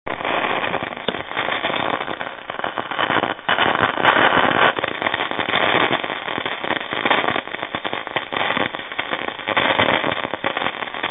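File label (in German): Gewitter Störungen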